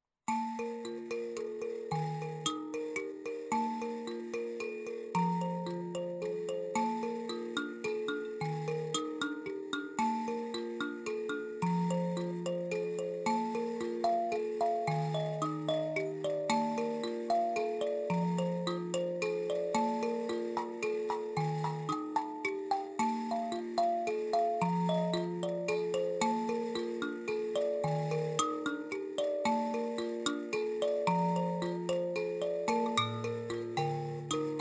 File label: chemutengure, mbira